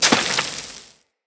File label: Melee Impact Dirt, Weapons